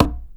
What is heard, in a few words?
p tumba tip, Drum Machines, Yamaha Motif ES-Series